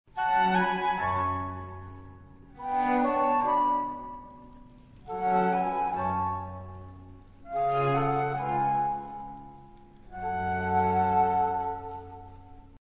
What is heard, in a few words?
G american baroque; MagnaLoops